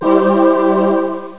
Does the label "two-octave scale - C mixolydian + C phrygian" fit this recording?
No